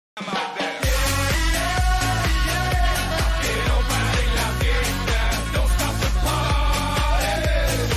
loud party

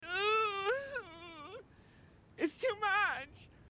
moan; moon

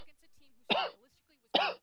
cough

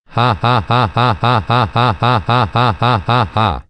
bot laugh; nunu

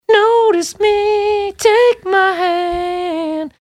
sings

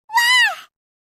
wahhh

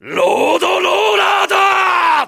roada rolla daaa

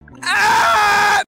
scream

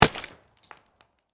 wood; Drewno 01

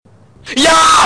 jaaaaaa

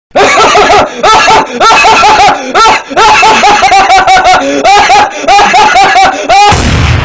annoying laugh

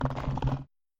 rattle; scp-173

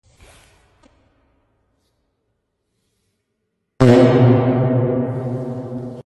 intercom fart

